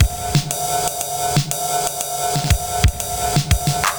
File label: Spring Verb Compressed TR 505 120BPM